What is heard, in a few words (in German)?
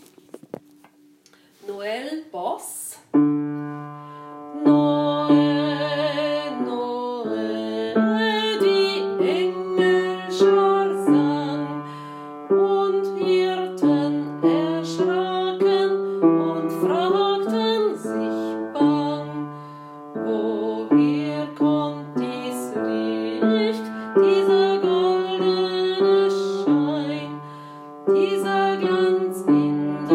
Noel Bass